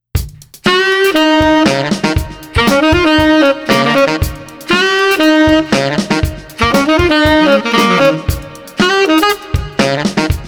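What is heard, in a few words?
Street Tenor Baritone Drums Positive, 118 bpm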